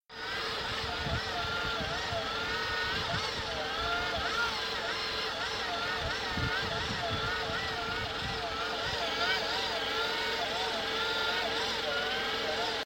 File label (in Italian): sterzo